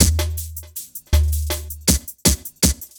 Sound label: Beat, Futurist Drum & Bass; Drums & Percussion